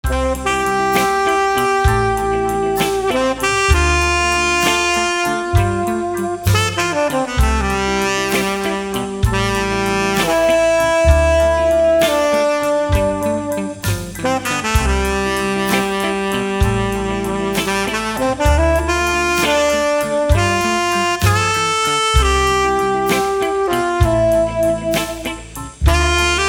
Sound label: 50s Sax Full